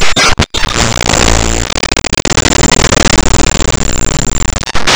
computer crash; ゆめ2っき

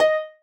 marimba rainbow; ゆめ2っき

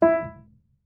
marimba piano; ゆめ2っき

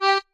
marimba chainsaw; ゆめ2っき

